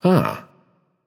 notice; smite; achilles

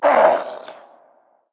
hitreact human male large; smite; npc; roman archer

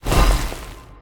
teleport end; smite; scylla

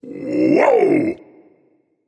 nv yay; Smite; ymir skin14

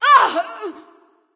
NV Ouch; smite; awilix

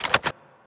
mvt armor; smite; arthur skin9

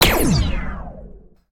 basic fire; smite; Anhur

